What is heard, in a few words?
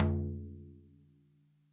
tar bas pp, hand drums; SoundBytes - Early Patches